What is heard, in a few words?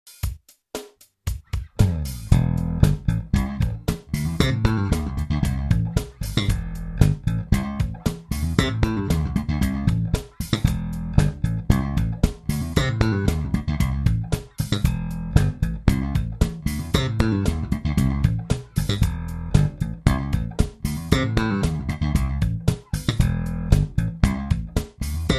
Slap fill in A